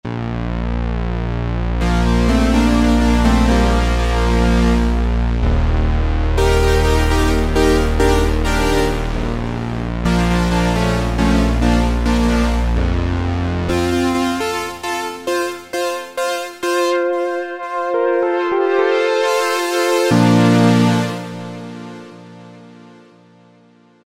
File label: Strings-Brass; Cheetah MS6